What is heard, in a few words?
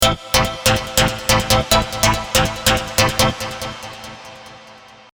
Pluck Rattled